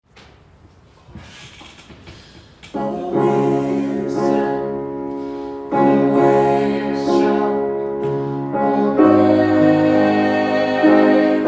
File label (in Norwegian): alle stemmer, gospel; kongsberg; kor; gospel for alle